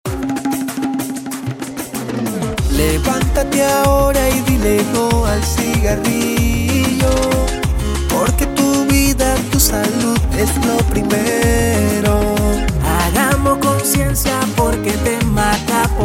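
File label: jingle, short version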